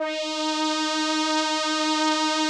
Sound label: SOLINA, String Machines